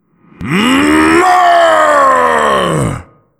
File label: coal barrage